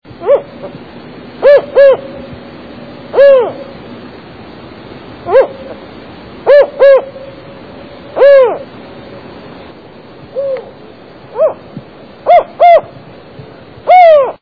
Spotted Owl

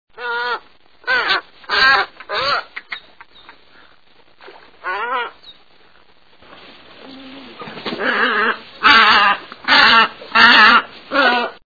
Tricolored Heron

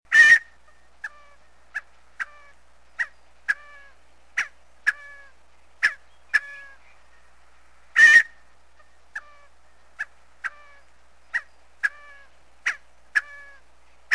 Scaled Quail

